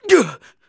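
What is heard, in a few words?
human middle male damage, voice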